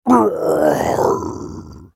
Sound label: Wolfman dying; Character Voices; English